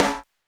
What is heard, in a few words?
Smashed, Beyond Snares; Airwindows